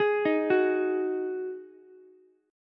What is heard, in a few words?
D sharp Minor Double Flat 5th D sharp, down, Ukulele; arpeggiated; chord